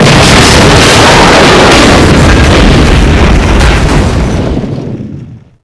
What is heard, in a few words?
dropship explode; firestorm; Gameleap; mw4